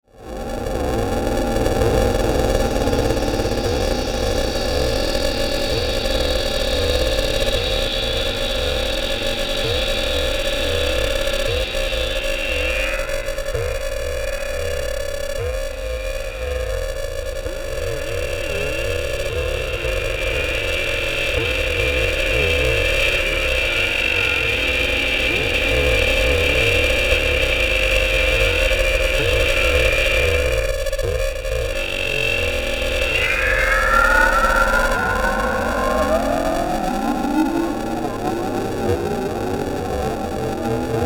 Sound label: Bed Pulsing Distortion Filter; Bed Sound Effects